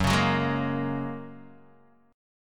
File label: F sharp Major Add 9th, Guitar DADGAD